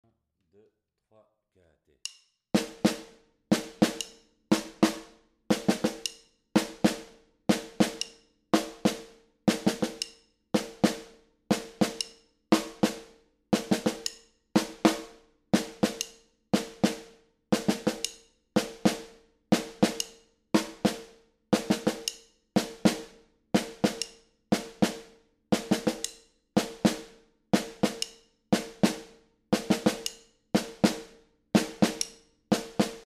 Reggae Ternaire Snare, Batucada

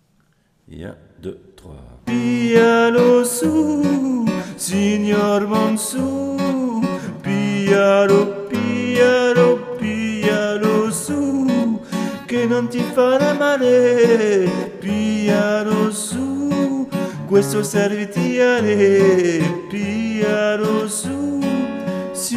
Voix Lead; Porceaugnac

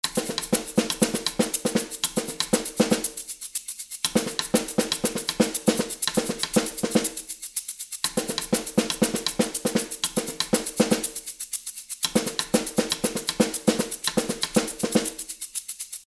Afro Cubain Snare, Batucada

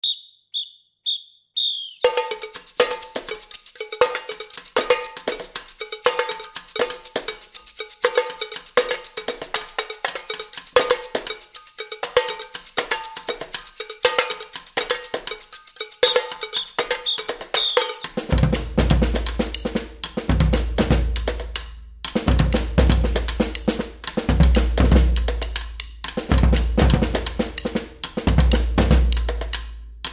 Afro Cubain Morceau, Batucada

